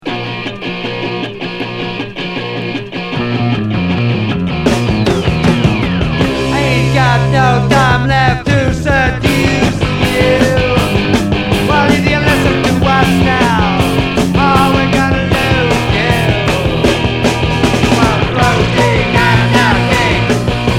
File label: Rock punk